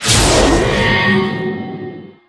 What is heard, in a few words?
crescend; Player FX